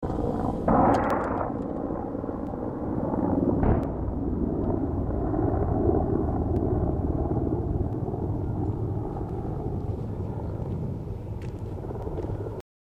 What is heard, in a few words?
mi35 rocket